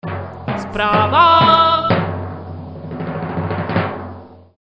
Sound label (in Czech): bubny